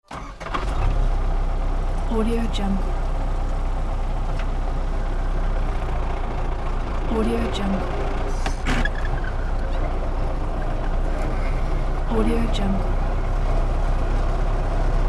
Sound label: tractor start driving and stopping at different speeds; tonwagensfxla